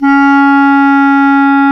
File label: clarinet, Clarinets; Clar Jazz; Roland L-CDX-03 Disk 1